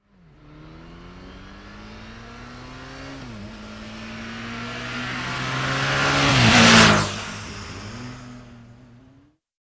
Motorbike Suzuki 750 Up and Past Fast; Motorcycle; Tom Hutchings

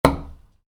Wood, Light Impact: Brick; Wood Sound Effects; Wood (Sound Effects:Materials); Stuart Duffield

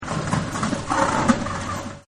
Wind It Up, Motors; Machines

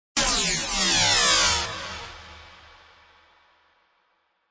Dark Synth, Dark Wicked Synth Hit; Dark Synth Strike

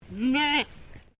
Animal Goat Toggenburg Kid Baa; Animals from Edgar's Mission farm in Victoria; Goats

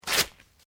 PAPER SHEET TEAR SHORT; Material components for constructing more complex sounds; Sound Effects:Materials

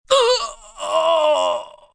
Pain Hit, Pain, male, hit and pain, agony, moan; (human voice); Sound Effects:Voice; VoiceBox

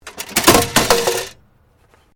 Metal Light Movement Minor; Materials

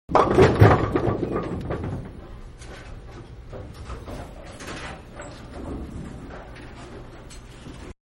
Bowling Strike only further away; Bowling (Sound Effects:Sports); Manhattan Production Music

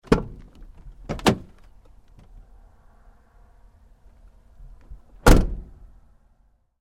Truck GMC Savana, Door, Open-Close, Med; 2010 GMC Savana; Trucks; Vehicles; Big Room Sound

